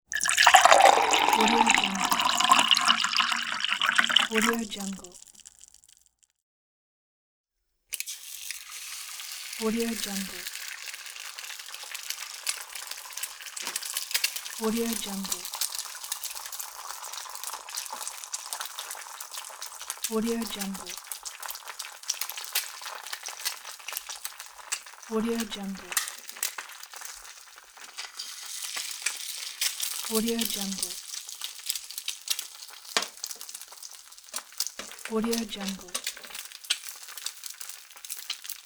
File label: cooking pack; domestic sounds